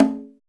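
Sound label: DYN conga, Drums, HIP-HOP; Drums In Your FACE!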